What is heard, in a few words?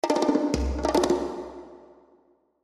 Percussion Ethnic; Percussion Ethnic Fill; Hit Sound Effects; Blastwave